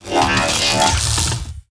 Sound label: Omega Buster Attack